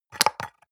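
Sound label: soap dispenser press almost empty short, bathroom; haus-garten; badezimmer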